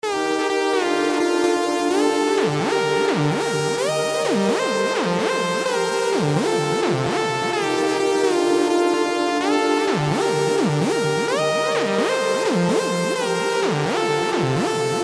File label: Electro Mosquito